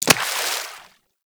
Melee Impact Water

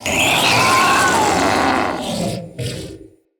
Ancient Shell Player Spotted Scream, Infested; Enemies

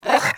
Puppy Interact Bark Speak; Vocals; Warframe; Kubrow

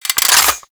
Revolver Rifle Reload, Weapons; Warframe; Tenno; Mod Quest

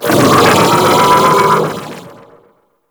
Vocal Attack; Warframe; Amalgam Override

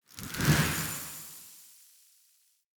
Wyld Soul Mode Activate; Fey; Saboteur

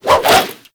Sword And Whip Spin Whoosh; Warframe; Weapons

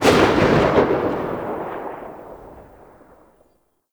AK47 Tail; Tenno

